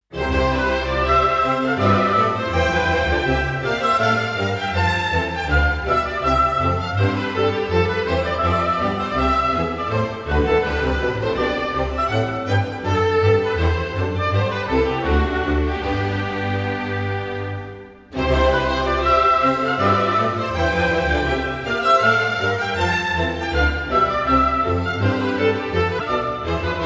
Suite for Orchestra in C Major

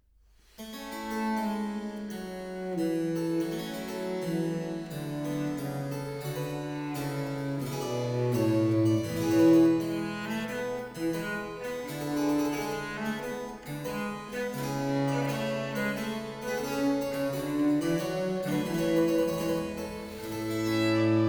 Trio Sonata in B flat major Larghetto

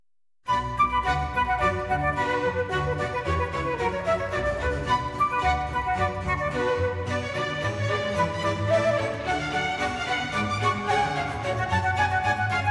Orchestral Suite in C Major

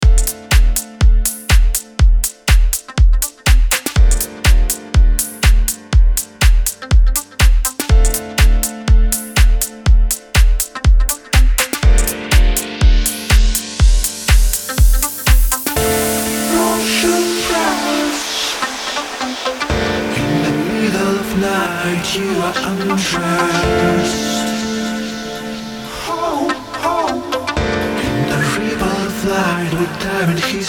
Instrumental Mix

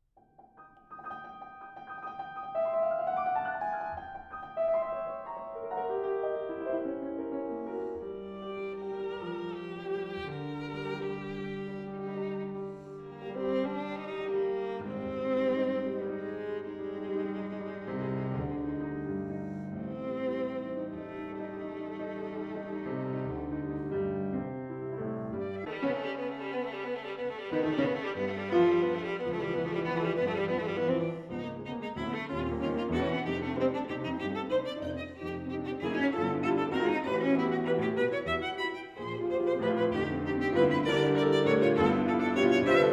Violin Sonata Finale

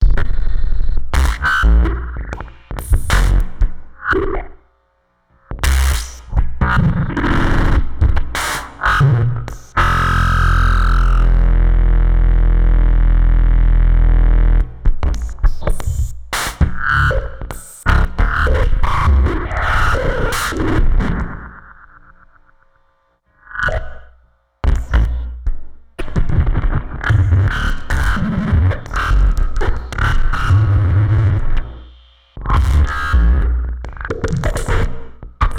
Automatic Drums

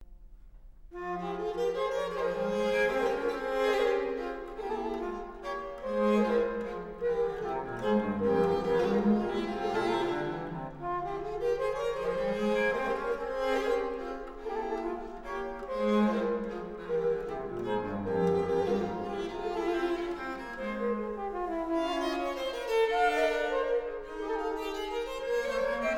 Menuet en trio

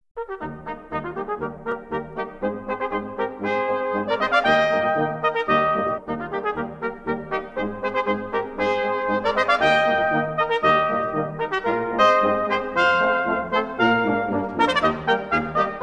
Brass Band

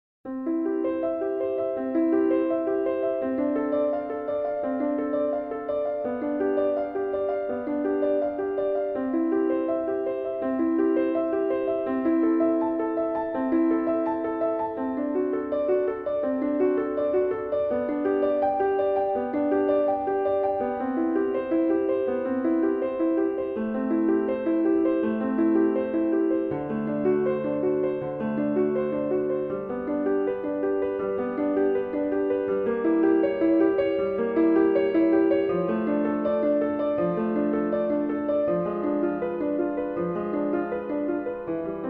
Das Wohltemperierte Klavier

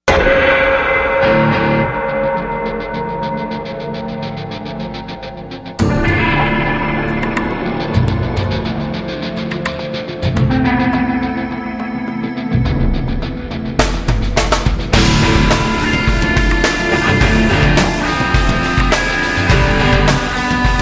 Guitar Grit; End Credits; Supernatural

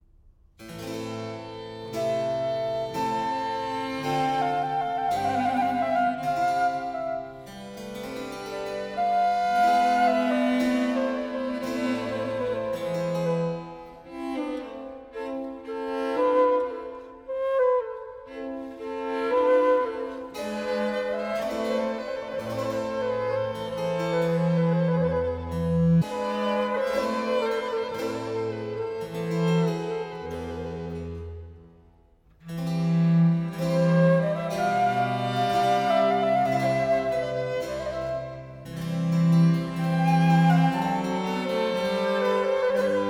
Quartet Tristement

